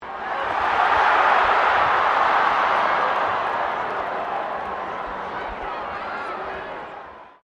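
crowd 15K Rugby World Cup cheer medium, Rugby world cup game; Crowd Sound Effects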